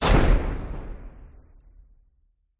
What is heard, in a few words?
Fire Spell Flamestrike; sound spark